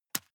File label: juggle catch medium